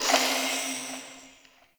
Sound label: Ext Mid Bird, Ambience